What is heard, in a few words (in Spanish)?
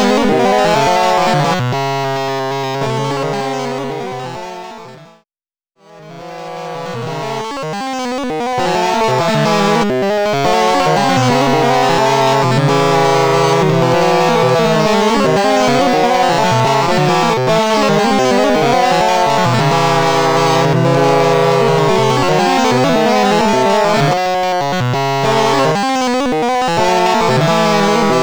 loop procesado freeze